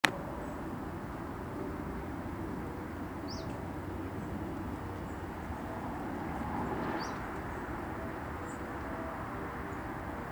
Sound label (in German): zilpzalp